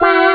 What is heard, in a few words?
chord; gremlins; ST-XX Amiga Soundtracker Sample Packs